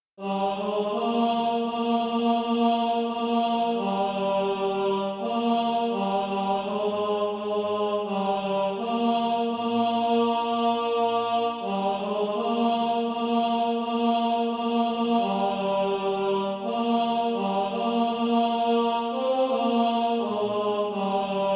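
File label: tenor, SATB Practice Music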